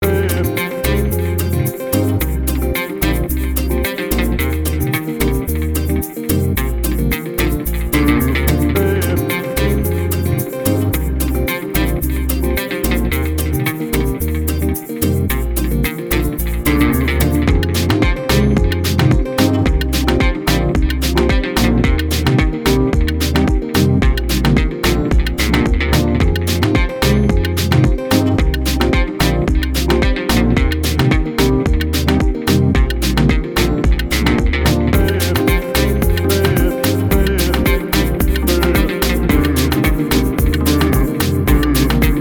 Латино-регги

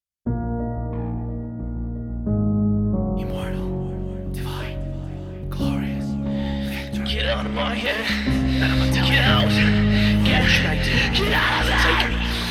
Композиция в стиле Progressive metal

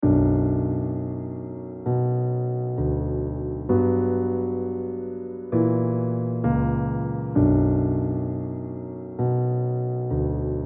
печаль

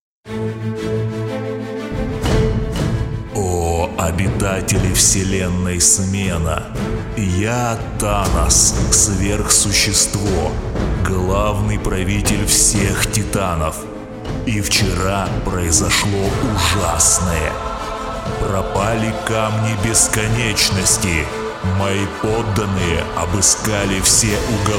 Эпик; Камни бесконечности

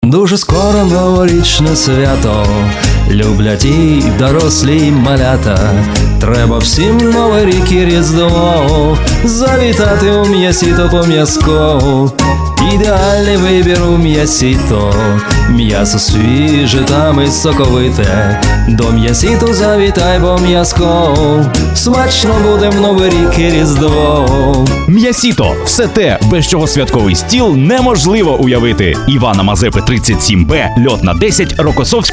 джингл; Мяссито

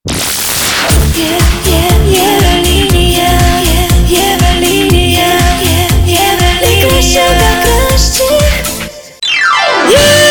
джингл; Евролиния

